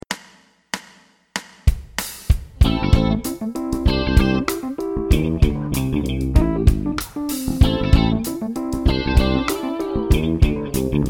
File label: fretless bass